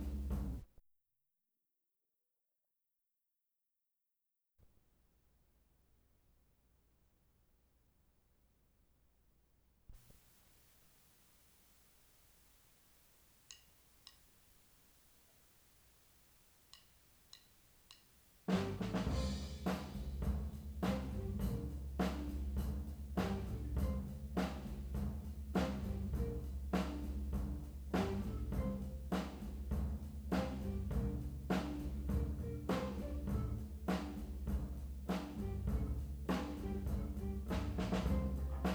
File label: floor